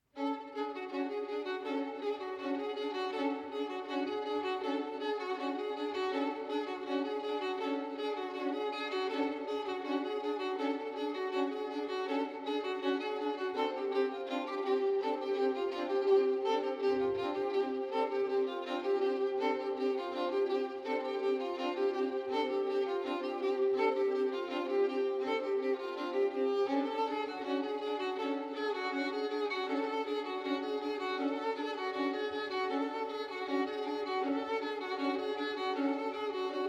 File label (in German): orawa fuer streichorchester; kilar